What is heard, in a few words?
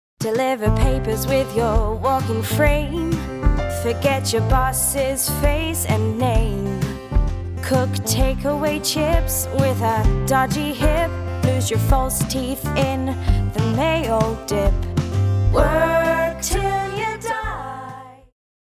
Singing